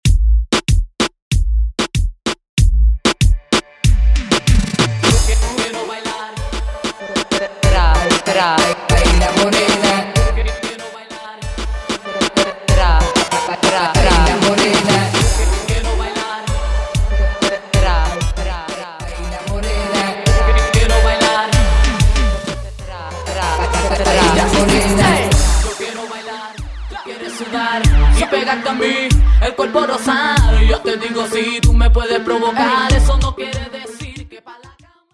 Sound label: Intro Break Outro 95 BPM